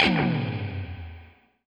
NOIZ, Guitar; 90 s Sample CDs; Quantum Leap - Guitar & Bass

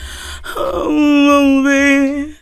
FEMALE VOX, Hip Hop; E-MU Formula 4000 Series Vol. 1; Hip Hop Nation; Phatt Phemmes 4

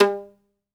SOLO VI, Synclavier Strings; ILIO

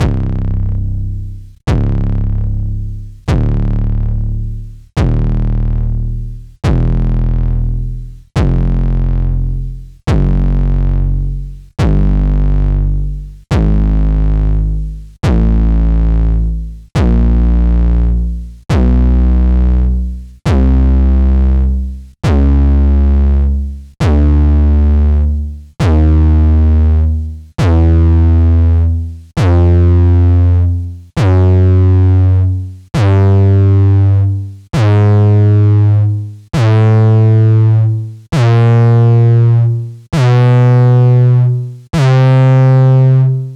808 Dist; Mbase11

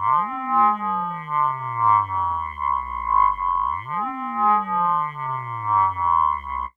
RING MOD; ATMOS

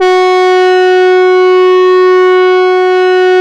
REED F#2; Smoov Leadz; E-MU Producer Series Vol. 6 – Analogia Project; Strictly Synthe

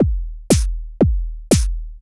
4 floor beat, trance

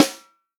snare drum, HIPHOP SNARE CHROMATIC; Best Service ProSamples vol.43; Real Drum Kits

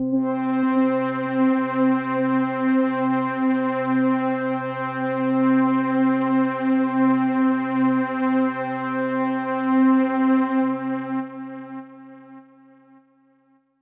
Pad C, Synth Prophet One Shots; Electronisounds

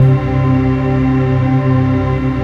HOOVER C3; Q Up Arts; Dream Experience; SYNTH

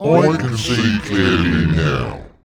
VOICE FX, Dance Vocals

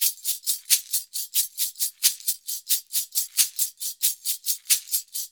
90 MIX, Percussion Loops

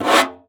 Conga, Session Instruments

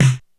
Snare, Korg DSS-1

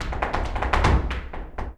Flamenco, ZAPATEADOSOL

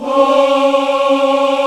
VOX, African Aahs; Roland LCDP11 Africa VOL-1

